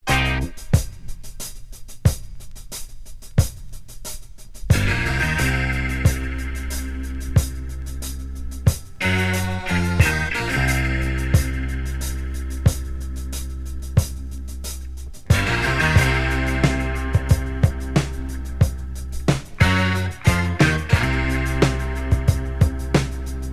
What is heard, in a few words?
vibrato